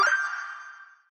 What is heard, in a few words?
Puzzle Storm Good; lila; lisp